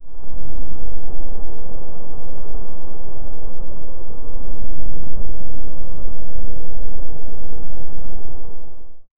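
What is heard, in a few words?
immersive ambient soundscape, arctic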